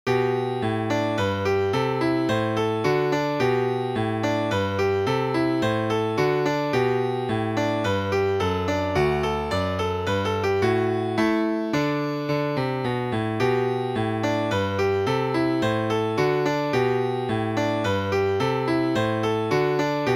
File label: Minuet